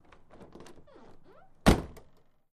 Citroen Deux Chevaux, Door Open and Close. Medium Cu.